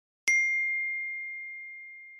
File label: iPhone note